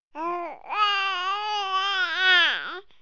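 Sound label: sugar, music production loops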